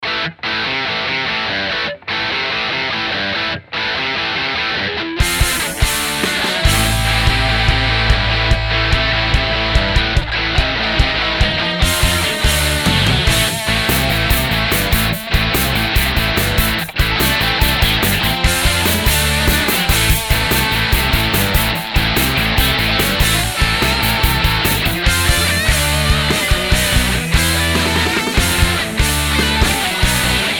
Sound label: rock guitar